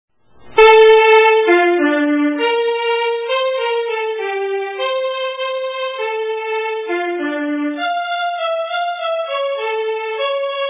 valsen; Dymmelvalsen

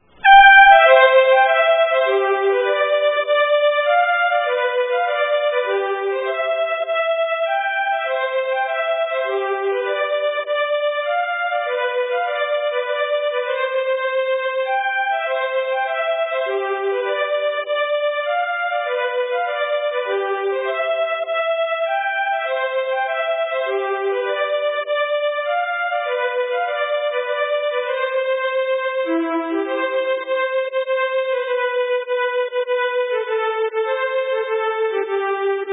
polska

